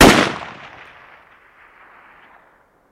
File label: Ak47 Sngl Shot